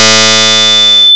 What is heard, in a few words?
CLAV ORG